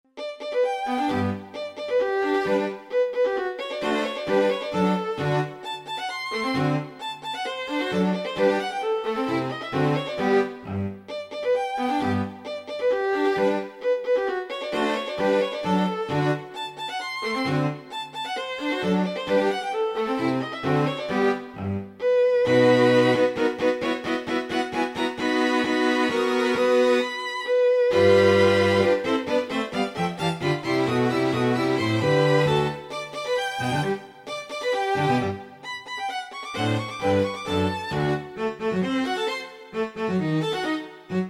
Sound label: strings quartet; ketron audya; bati ses ve ritim tanitimi